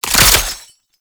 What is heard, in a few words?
Belt Fed Rifle Reload; Warframe; Tenno; Frumentarius